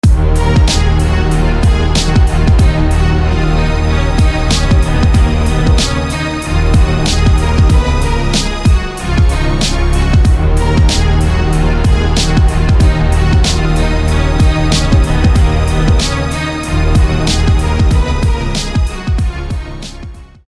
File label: Drama Strings